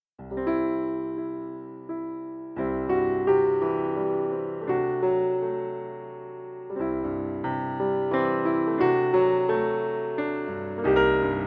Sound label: Accomp; The Little Mermaid - A Musical Adventure; Ariel